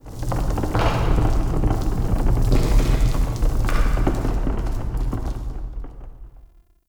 Cave Mine Rumble; Ambience; Midrath Island

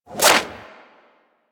Serpent Ability Tendril Whoosh; Warframe; Warframes; Alchemist

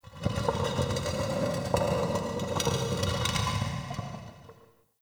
Stone Movement, Crypt Ambience; One Offs; Champion Crypt

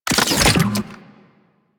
Briefcase Launcher Unequip; Warframe; Weapons; Corpus

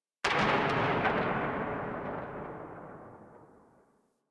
Arch Gun Primary Beam Tail Ext, Weapons; Warframe; Archwing; Hildryn

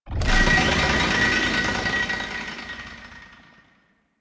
Masseter Whoosh Large

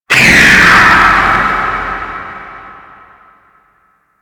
Warning Screech; Enemies; DE; Warframe; Vay Hek

